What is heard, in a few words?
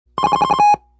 Phone, BEEP; noisetoys